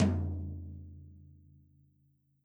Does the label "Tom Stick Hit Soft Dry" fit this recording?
Yes